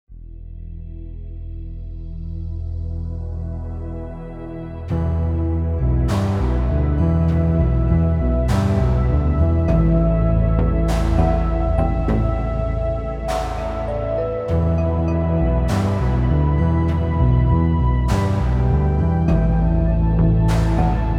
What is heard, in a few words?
music menutheme; Spacebase Startopia